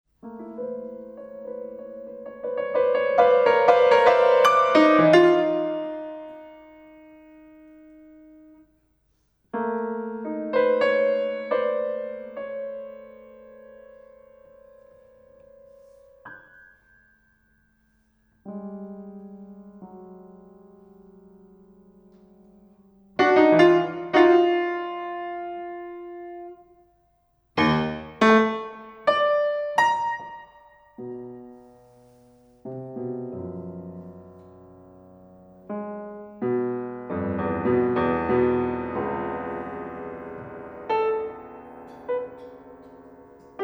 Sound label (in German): klavierzyklus